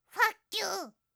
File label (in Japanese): ポップアップウィンドウのブロック